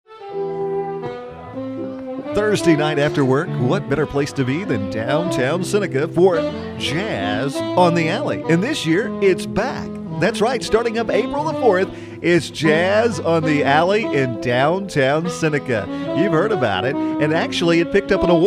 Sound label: Jazz